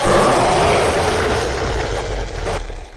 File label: roar